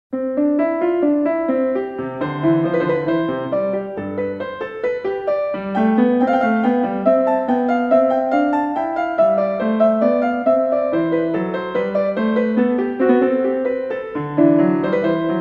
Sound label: two part invention no 1 in c major